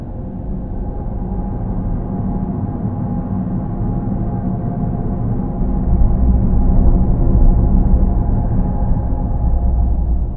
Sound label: noice; svencoop